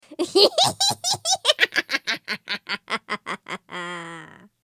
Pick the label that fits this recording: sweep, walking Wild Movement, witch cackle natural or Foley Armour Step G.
witch cackle natural